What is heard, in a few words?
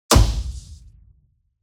Shoot the paintball gun